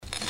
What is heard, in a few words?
Front Menu Rock Hover; Survivor Ultimate